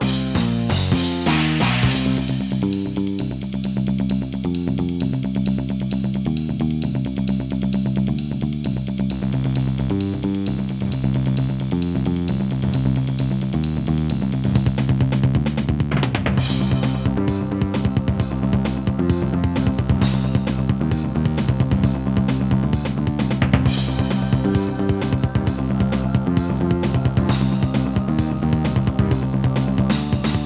Alesis Harmony 32; sigil doom episode 5

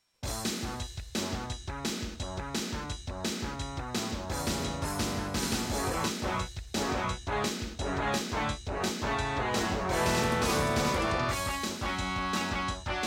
Intro, Labway LWHA111800 (AdMos QS700); Duke Nukem 3D

